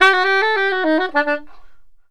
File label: SAX, Balkanish Traditions